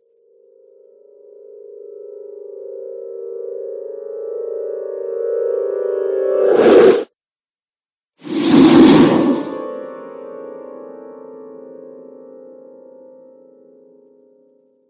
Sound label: Horror Trailer Whooshes